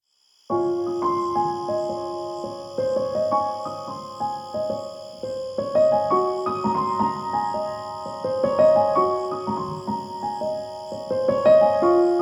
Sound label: Forest Piano Music for Work and Reading; spa; nature